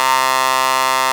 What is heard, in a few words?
RAVE BEEP; Best Service Alpha Dance vol.2